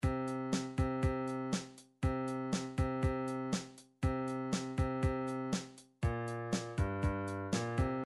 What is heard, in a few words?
rock, midi